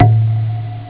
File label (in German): tropfen; ST-XX Amiga Soundtracker Sample Packs